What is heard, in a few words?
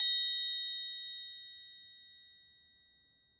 Triangle Open; One Shots; Drums; Ableton Live 12 Lite; Core Library